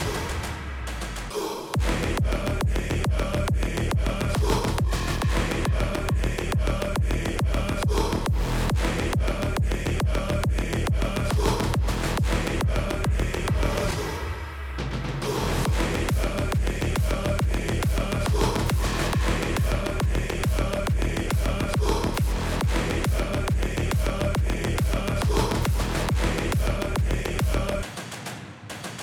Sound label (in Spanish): PsyTrance Drop sin mezcla; psytrance flp mixing and mastering; km music academy